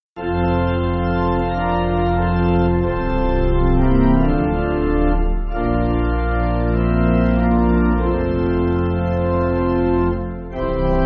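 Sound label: Pipe, Pipe Organ, Backing Files for Churches; James H. Fillmore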